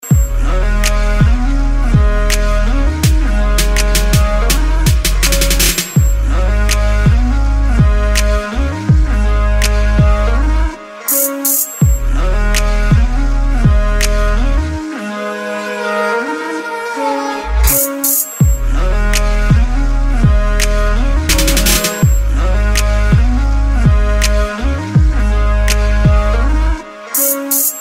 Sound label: Sad Ringtone